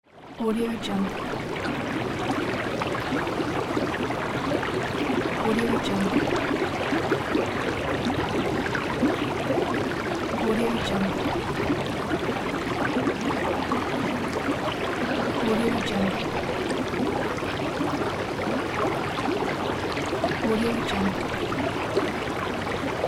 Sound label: water